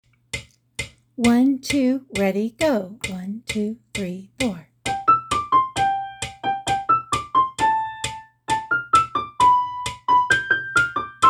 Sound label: Duet Fantasy Jingle Bells PRIMO